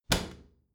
bathroom cabinet close heavy; objekte; tueren

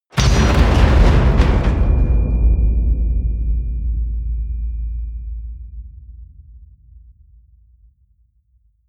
hit metal; hits explosionen; metall

